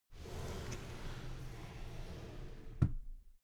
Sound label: drawer cabinet paper close soft